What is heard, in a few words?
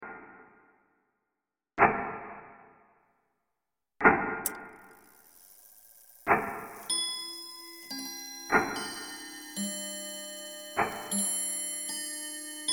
Clock Ambience; Memory Stairs